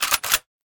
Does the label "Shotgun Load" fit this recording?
Yes